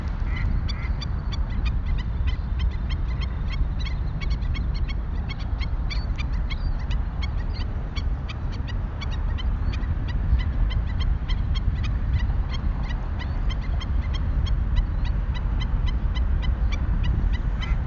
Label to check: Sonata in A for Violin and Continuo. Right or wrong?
Wrong